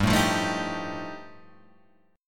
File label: G 7th Suspended 2nd Sharp 5th G chord